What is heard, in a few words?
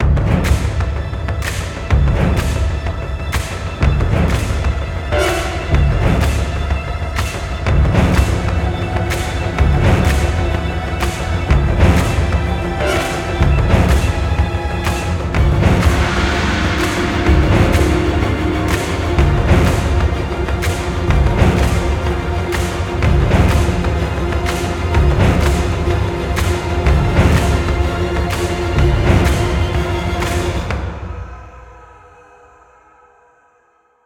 locked on; ice age scrats nutty adventure